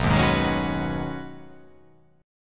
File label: B 6th Add 9th B, chord